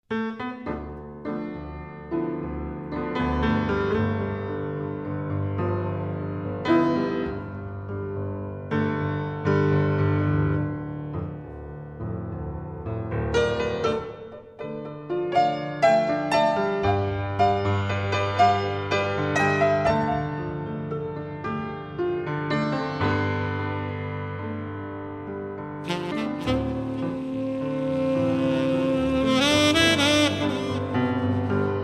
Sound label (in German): jazz freie improvisation